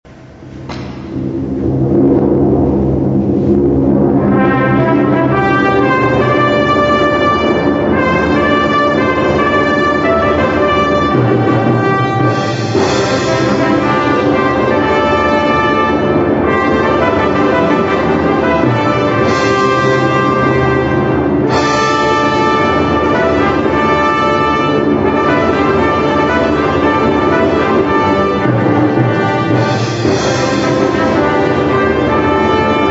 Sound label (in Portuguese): clarinada rainha